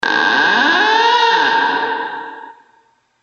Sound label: door